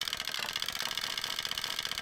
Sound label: Chattering Teeth